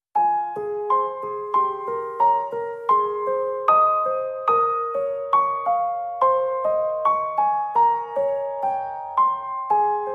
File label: Piano